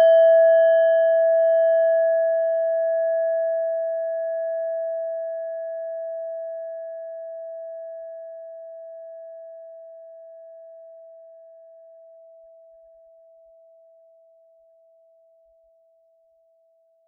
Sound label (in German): klangschale nepal, Klangschalen Nepal; Hörprobe der Klangschale